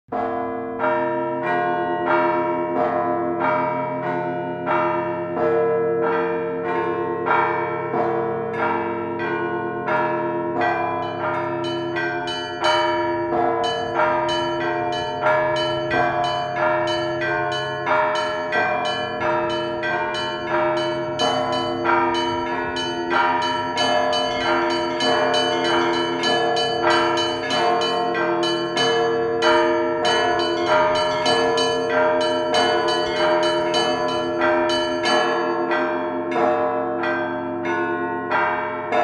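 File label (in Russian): Покровский звон, традиционные колокольные звоны; Музыка христианская; Православные; Russkie tradicionnye kolokolnye zvony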